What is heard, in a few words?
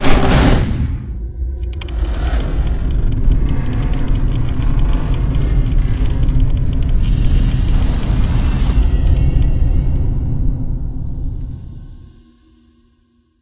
Floor Open; silent hill homecoming 2008 gamerip pc ps3 x360